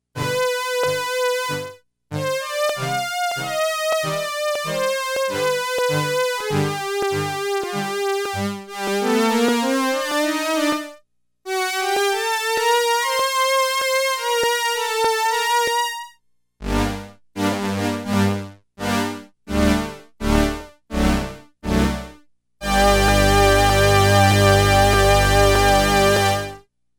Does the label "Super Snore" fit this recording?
No